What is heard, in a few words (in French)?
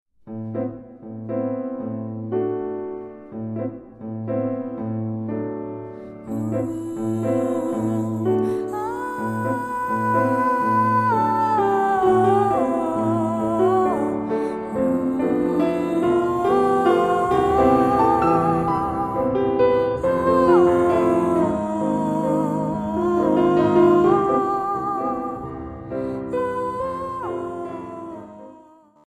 valse